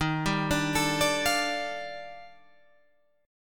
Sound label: D sharp Major Sharp 11th, Guitar, Open G